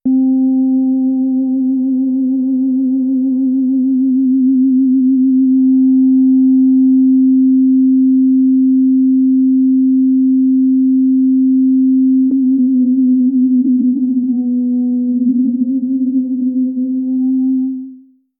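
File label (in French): synth lfo doux avec oscil; melodic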